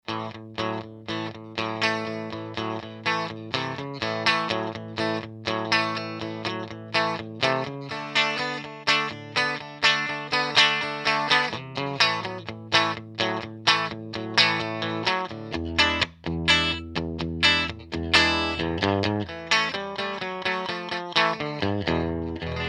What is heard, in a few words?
tone attack riff dry